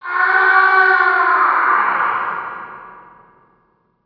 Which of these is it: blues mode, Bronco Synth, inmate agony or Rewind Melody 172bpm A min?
inmate agony